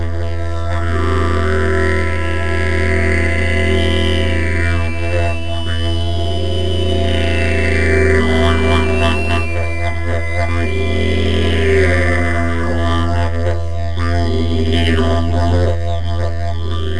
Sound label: didj